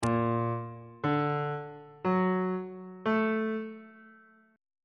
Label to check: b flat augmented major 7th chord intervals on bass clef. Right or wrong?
Right